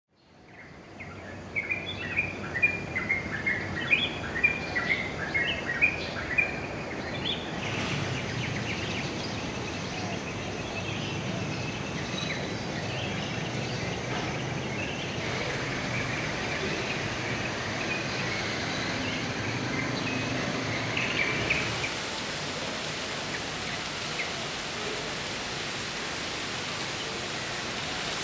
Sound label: Birds of Indonesia Птицы Индонезии